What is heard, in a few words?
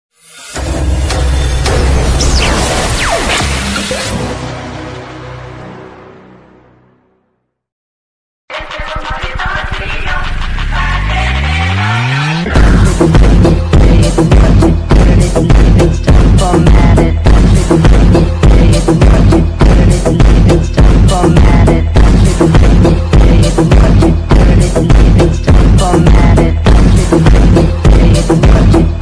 english ringtone remix ringtone bass boosted ringtone dj